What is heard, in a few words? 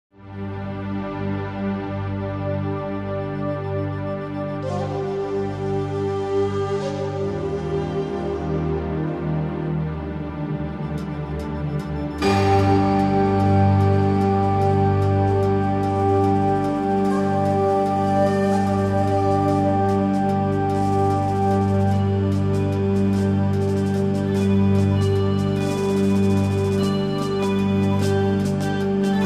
instrumental